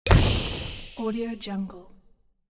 small magic explosion

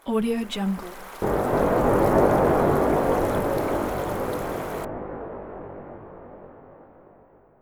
thunder with rain

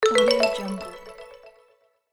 fluttering mallets levelup; monochromeeffect

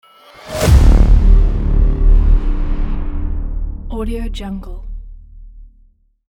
trailer hit

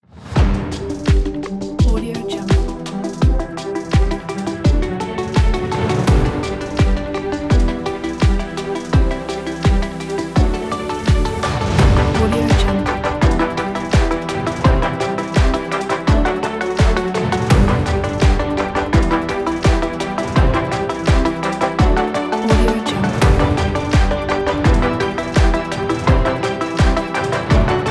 inspiring corporate technology background

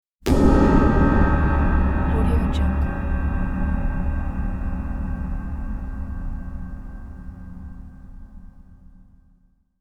trailer gong; volkovsound

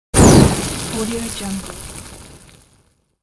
kerosene can explode

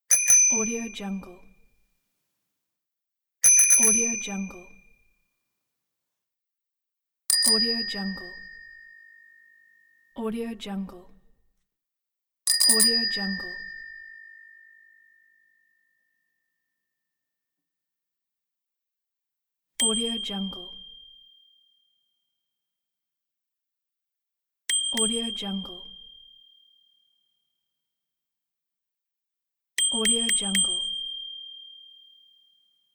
bicycle bell

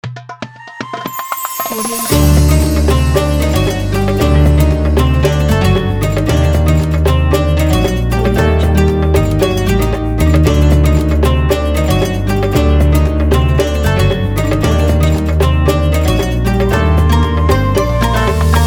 ramadan opener logo

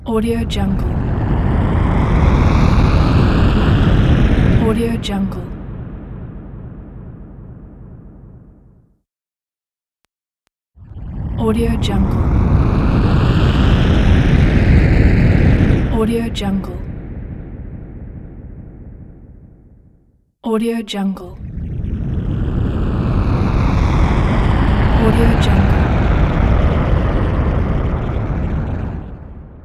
spacecraft

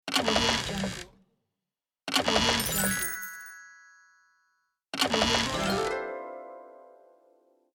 treasure chest open

